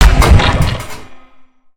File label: Spider Die Hit Goo; creatures mechanical; Lego